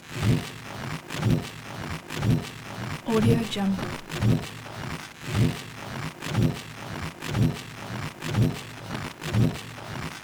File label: lost transmissions designed alarm; soundmorph